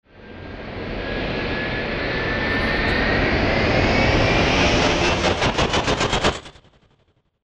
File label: cinematic tension builder; tibasound